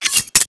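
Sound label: draw, butterfly knife; weapons; cstrike; csgo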